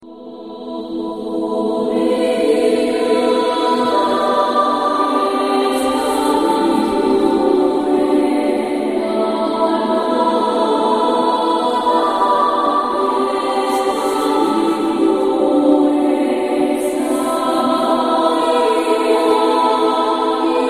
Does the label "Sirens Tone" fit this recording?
Yes